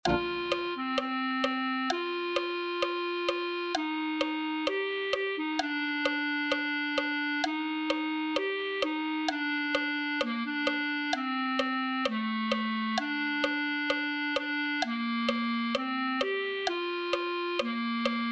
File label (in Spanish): clarinete